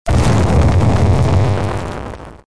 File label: spinjitzu attack; lego